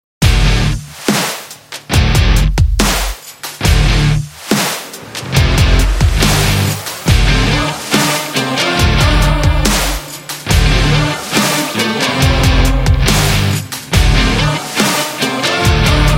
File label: Uplifting Indie Rock